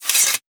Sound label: sheathe sword; warrior; Aragami